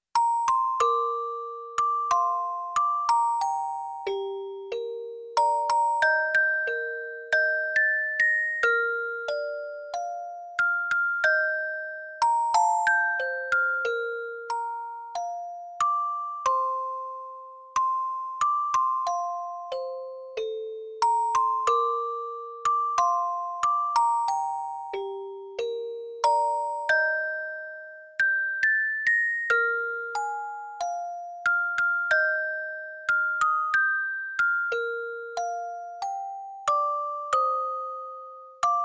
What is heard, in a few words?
musicbox